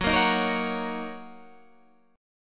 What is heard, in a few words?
Gb 6th, Guitar Drop D; chordshape; down